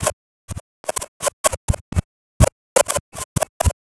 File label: perc 125 broken bitz, Percussive Loops 125 bpm; Sample Magic - Transmission-X